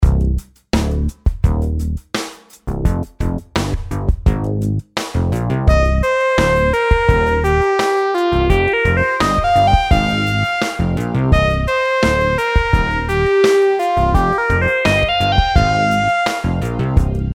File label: rhythm simple 8s triplets